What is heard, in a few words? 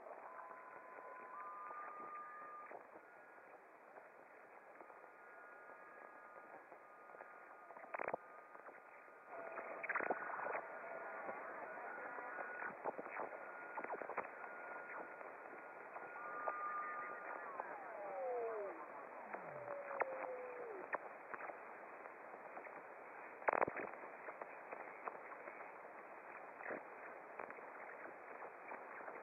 swisher